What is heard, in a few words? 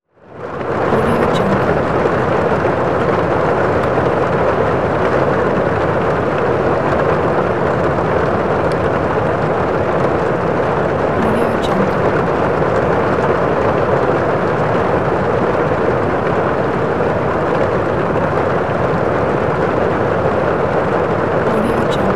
rain from interior